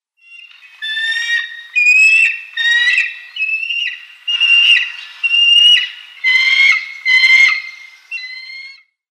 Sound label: White-tailed Eagle nestlings